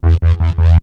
Korg Prophecy hits, arps and fx

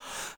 BREATH; Northstar - New Gold VOL-2

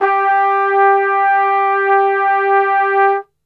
G 2, Brass; Best Service; Brass Super Section

